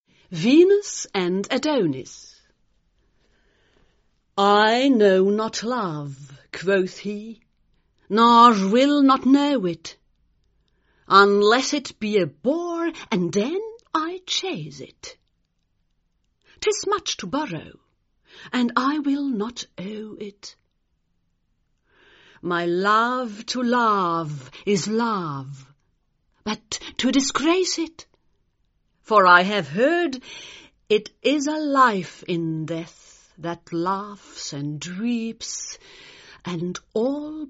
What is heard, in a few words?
Breath